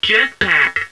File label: JETPACK; THEXDER